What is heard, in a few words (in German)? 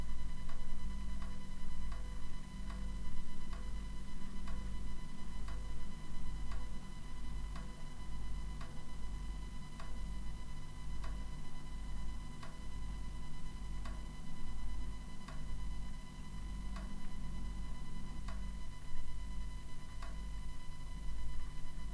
kuehlgetraet zuhause; kuehlgeraet zuhause